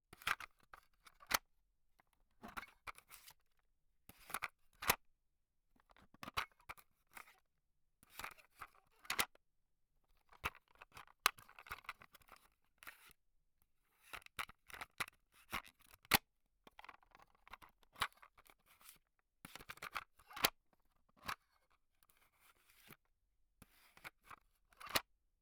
CASSETTE TONE Tape in out case, with paper, close perspective